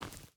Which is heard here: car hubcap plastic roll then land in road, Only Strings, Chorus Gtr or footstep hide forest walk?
footstep hide forest walk